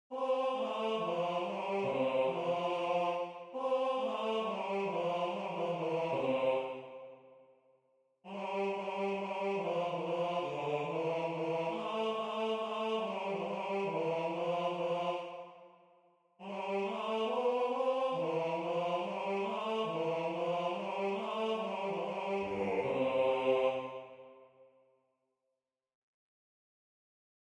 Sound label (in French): MAGN Basse; Durante; Magnificat